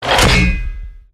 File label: rocket reload; silverback